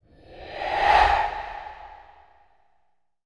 Reverse Surreal Breaths; Sound Design - Horror; Sony Pictures Sound Effects Series Volumes 1-10; Sony Creative Software

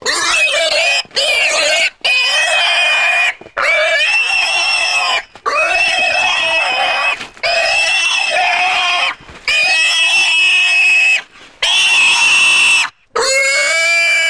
Pig Squeals Intense; Animals; Sony Pictures Sound Effects Series Volumes 1-10; Sony Creative Software

